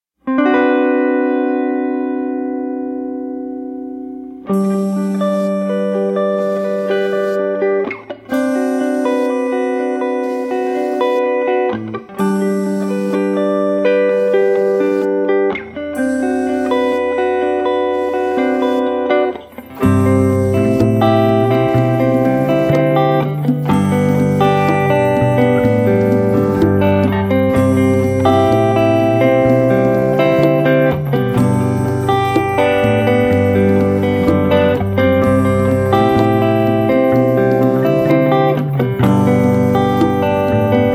Six String Lullaby

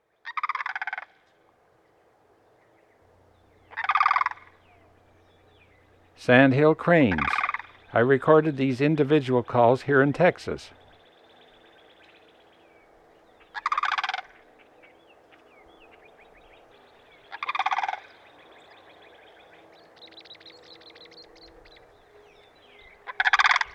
Sandhill Crane; Western North America; Dan Gibson; Wildlife Identification By Sound

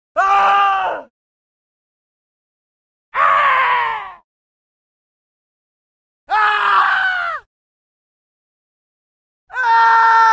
Death Screams 10 Male Scream; Historical; Hollywood Edge Historical Series; War Horses Armies & Weapons

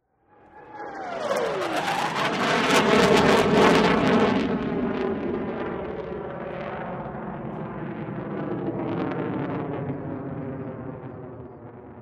F18 Fly by; SOUND EFFECTS1

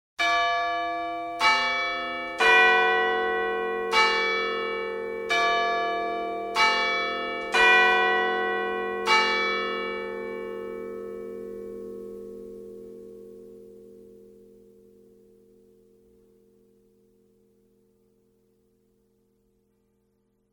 Chimes tolling hour; SOUND EFFECTS1

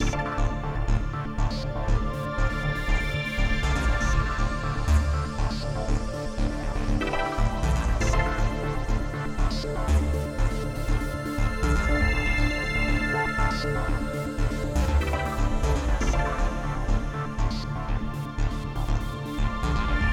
Hard hitting news

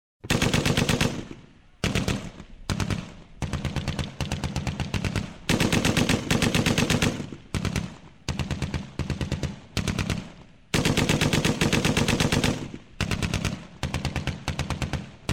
Machine Guns; SOUND EFFECTS1

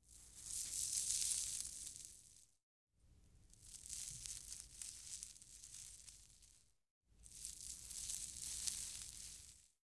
Cigarette Sizzle Burning Crackling; Foley - Props; Foley Essentials

